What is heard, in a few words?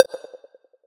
on passcode press